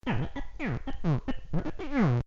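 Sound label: laryngeal vocal scratching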